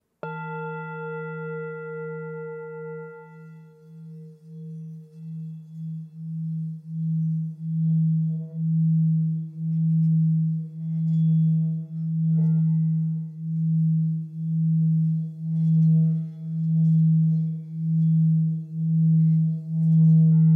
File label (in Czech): tibetska misa